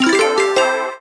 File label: super meter joker win; mega joker